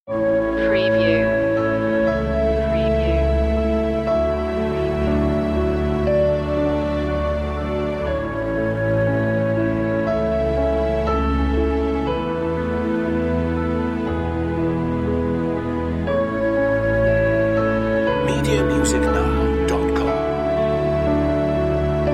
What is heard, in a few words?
irish valleys intro only